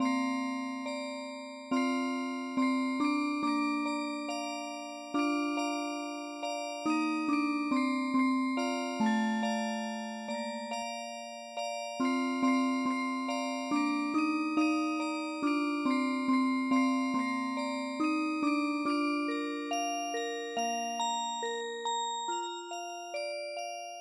Music Box

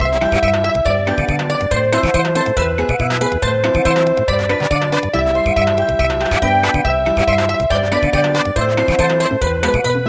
Samba

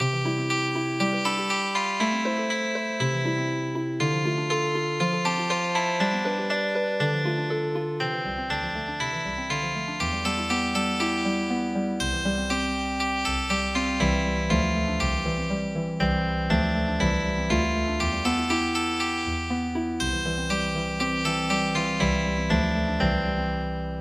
Piano Healing Harp

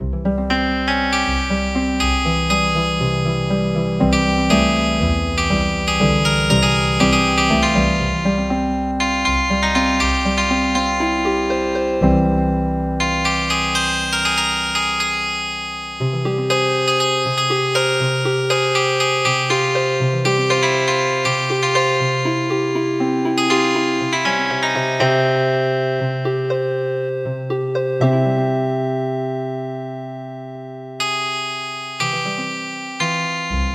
Healin Harp

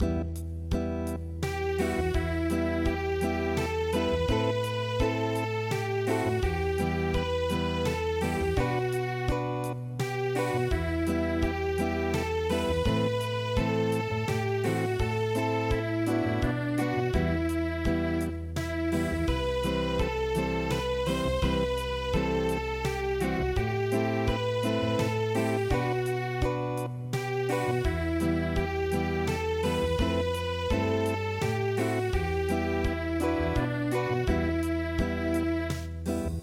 Lamento Unplugged

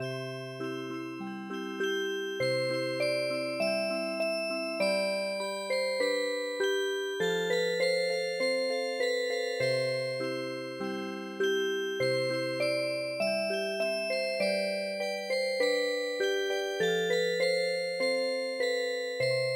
Music Box, traditional

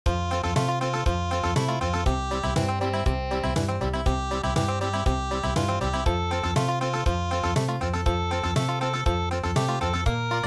German Festival Country

